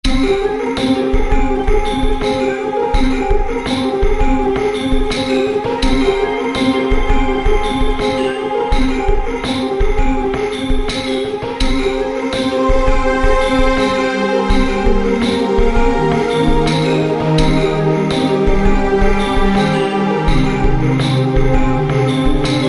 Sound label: Dungeon